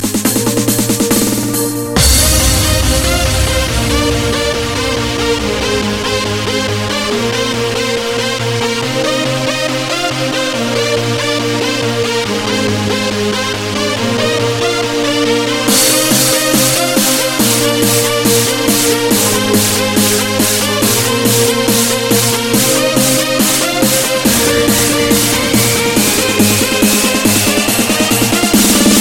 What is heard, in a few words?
trance music ringtone